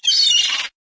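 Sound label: rural seagull; random amb sfx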